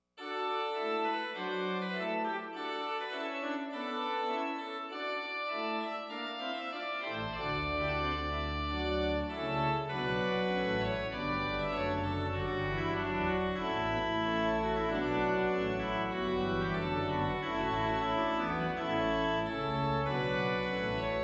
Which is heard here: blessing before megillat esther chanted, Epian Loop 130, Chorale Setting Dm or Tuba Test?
Tuba Test